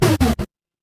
retro game game over

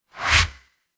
ui swipe

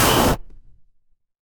retro game explosion

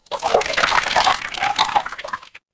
water swirl

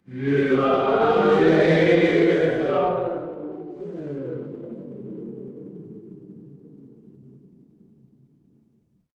crowd cheer

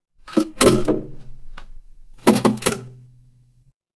mechanical lever

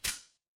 impact sword draw

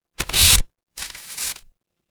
paper fabric tear

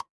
ui pop

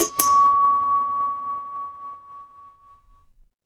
instrument singing bowl

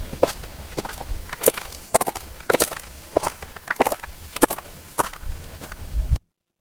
footsteps gravel

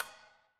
impact anvil

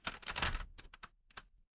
door lock

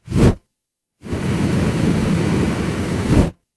fire electric whoosh flame

